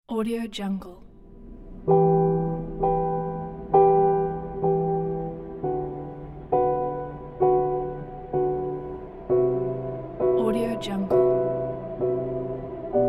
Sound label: sad depress